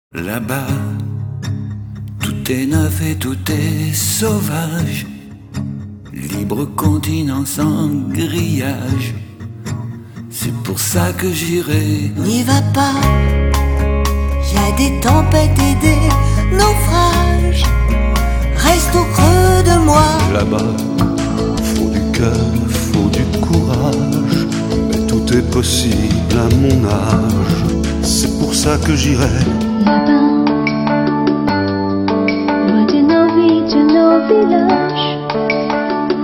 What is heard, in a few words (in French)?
Chorale